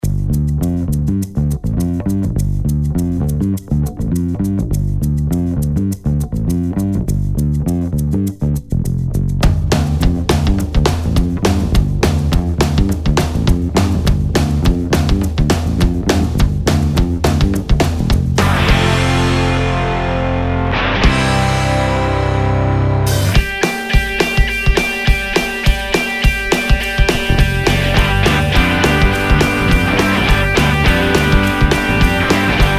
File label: Rock Rock Roll